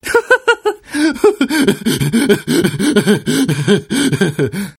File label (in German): lache, ringtones